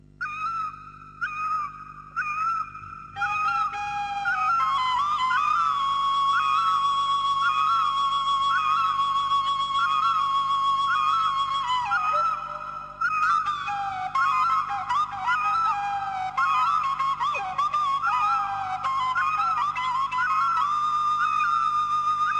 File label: Hmong Flute